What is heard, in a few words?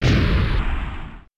Sword Attack; Kirby; Super Smash Bros